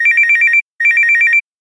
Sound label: Ring Ring